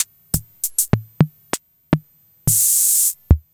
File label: kit, Samples Sliced; m8